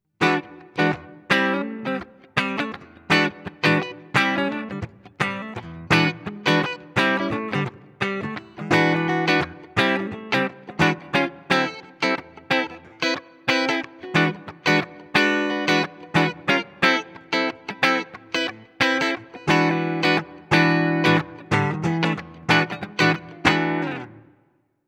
X700 Neck Humbucker Clean Voice 2; Cort

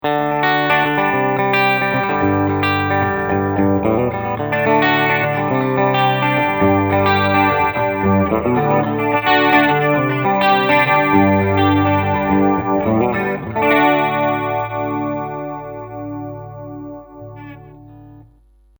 Detune Shallow

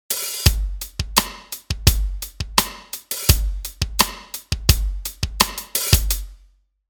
Nektar Aura Hyper Rock Kit

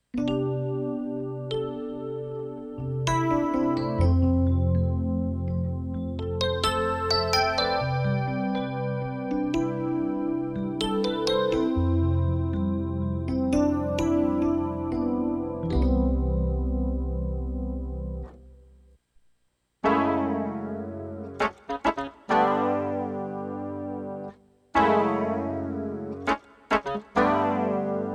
E Piano

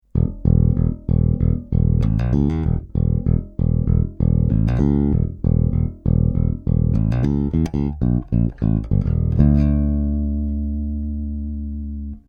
Pyramid Nick Round Bass

